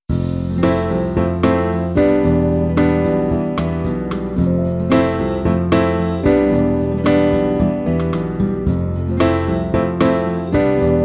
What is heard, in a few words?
Performance, recorder